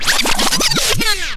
backspin, Vinyl; Misc Percussion

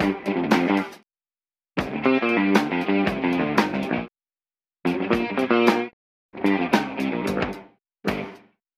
guitar drums, funky drum loops

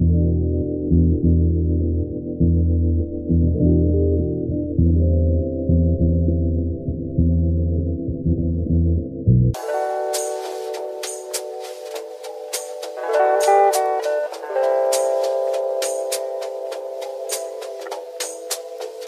guitar

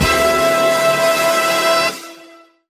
big band finisher

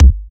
xcretion, Kicks Electro

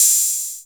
Hats Electro Open

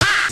"ah", gutteral; Voice; ooh ahh

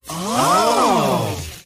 "oh" crowd realization; ooh ahh

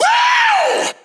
woo

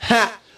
ha; Voice; ooh ahh

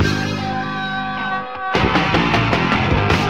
guitar, loops; Phonky

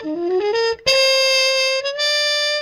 horns, phonky loops

